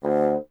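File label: wind bassoon D; Miroslav Vitous - Solo Instruments VOL-1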